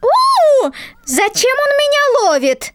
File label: Diner Emote; Light Buford